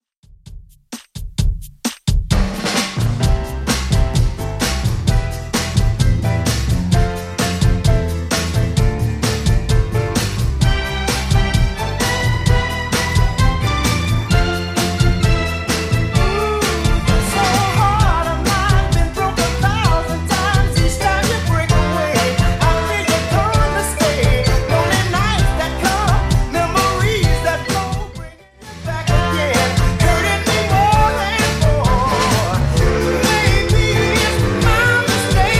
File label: 60s Redrum 130 bpm